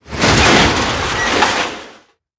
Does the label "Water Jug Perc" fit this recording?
No